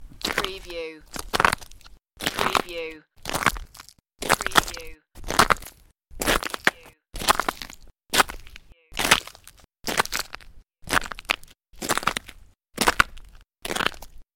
Snow and Ice, Footsteps ice very cracky

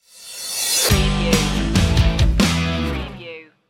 rolling free stinger

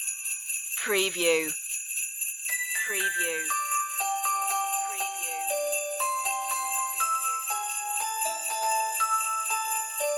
merry christmas synthified

